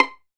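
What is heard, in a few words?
PIZZ VIOL, Solo Violin; E-MU Producer Series Vol. 2; More Studio Essentials; Composer; St. Arco Solo Violn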